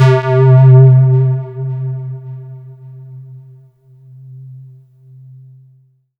C4, Synth Line

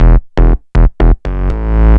BAS, 2 Step

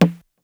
Tom Hollow; Lost Tapes From Mars

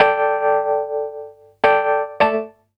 keys, Roots Funk; USB Soundscan vol.41; AKAI

